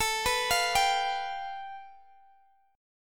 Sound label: A 7th Suspended 2nd Sharp 5th, Guitar, Open G; x,x,19,16,18,17